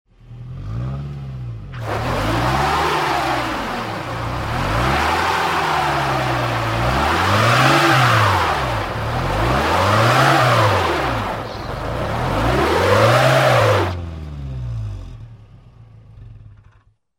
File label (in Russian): mashina popala v koleju